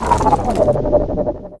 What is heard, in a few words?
gauss SPIIIN